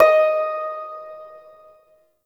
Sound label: Prime Shawzin Oct Three D Sharp; Warframe